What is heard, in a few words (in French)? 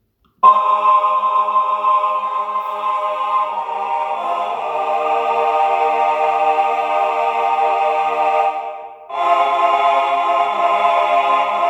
Laissez vous Mener Par l Esprit Choeur